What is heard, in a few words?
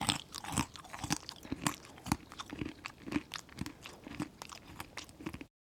chewing nuts; sonniss gdc